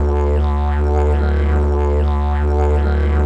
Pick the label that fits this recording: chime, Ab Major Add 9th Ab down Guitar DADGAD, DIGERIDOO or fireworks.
DIGERIDOO